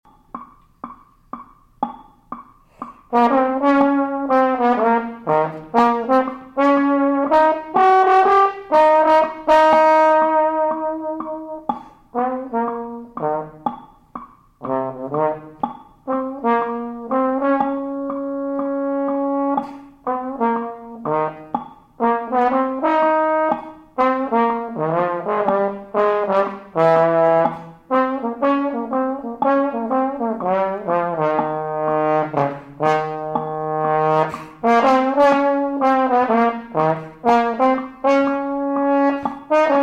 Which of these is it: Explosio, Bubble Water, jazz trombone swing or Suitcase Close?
jazz trombone swing